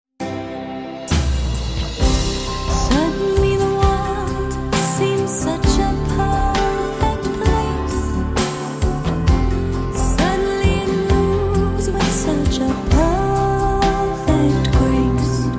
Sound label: vocal, string quartet